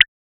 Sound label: short tactile ui click; mobile game